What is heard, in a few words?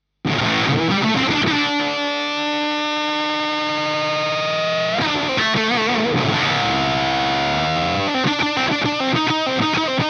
FUZZ plus OCT